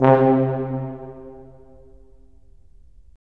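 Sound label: brass tuba; LA Composer vol. 3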